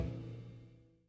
Loop Tail; Good Job

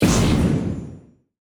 Attack Impact; portal quest android; Yoda

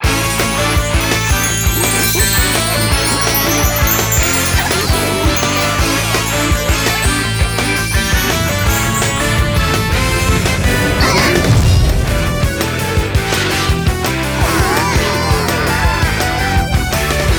Story Mode Opening (includes SFX); Super Monkey Ball Banana Blitz

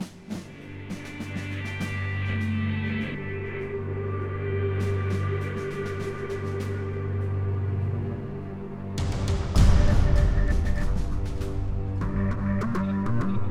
Battle Tension

